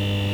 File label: neon; hl1mp; streetlevel